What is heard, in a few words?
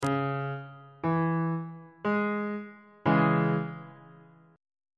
d flat minor chord